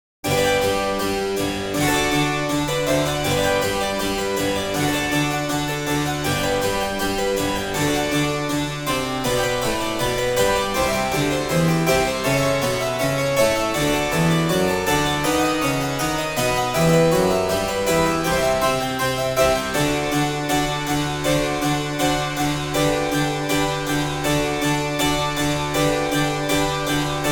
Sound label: Gloria FLUTE cemb 2 voci CEMBALINO; Cembalino & Organetto part; harpsichord